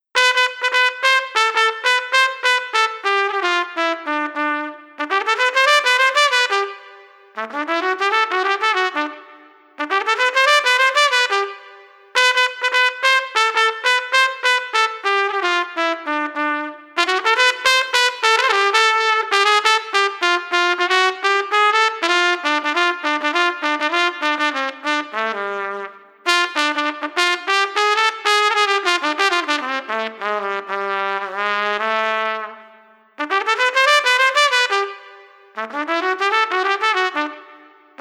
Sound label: Street Trumpet Jumpin 100